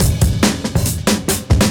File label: Beat Style, 140bpm, breaks